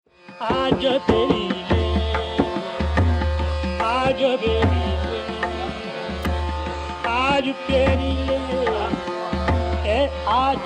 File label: Gauri Basant